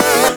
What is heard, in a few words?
Synth Sound; Vengeance Samples; VEE Synth Shots